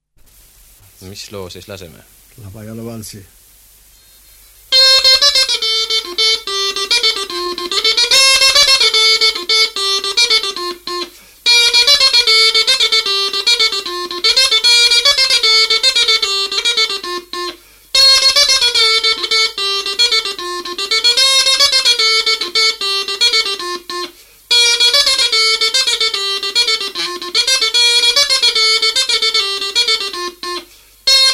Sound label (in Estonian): Labajalavalts, roopill, folk; Karksi; Peeter Sild